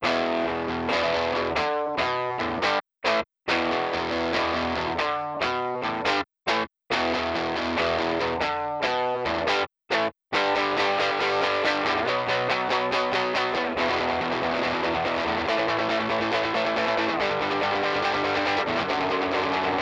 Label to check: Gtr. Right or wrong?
Right